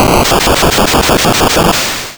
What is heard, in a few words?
Destroy; RPG